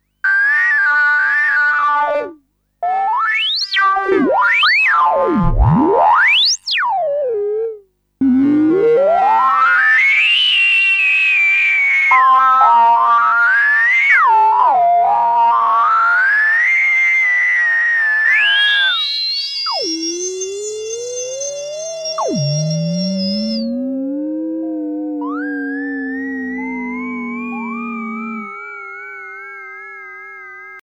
chroma reso; RHODES

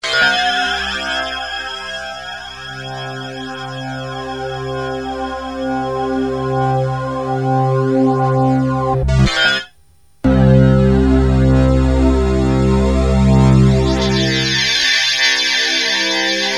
wavetables, waldorf

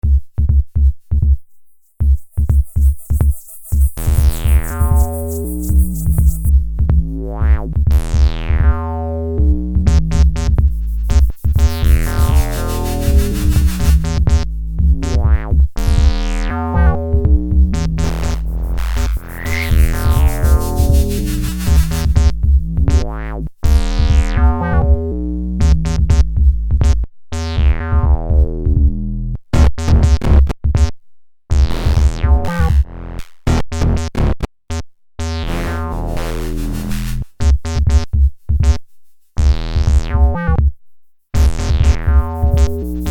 semtex 2 moogulator

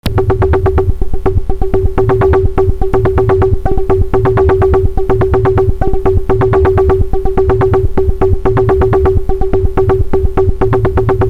rhythm, waldorf; xt

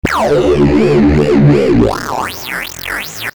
DnB soft lead

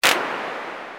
drm1 Claps

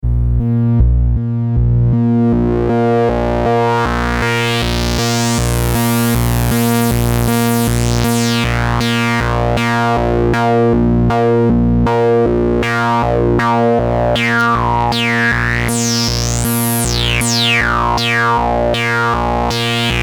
Pulse Band Pass, tinysizer

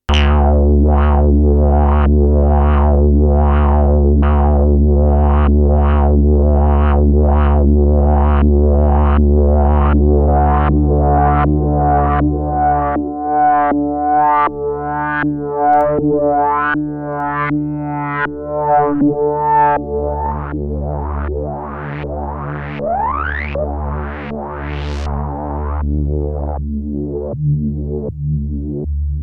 polivox eridoo; auralesque

